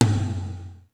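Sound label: mid big, tom; sr16 tom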